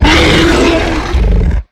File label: Harpooned; Evolve; Behemoth